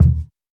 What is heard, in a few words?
kickdrum sound E key; kick drum samples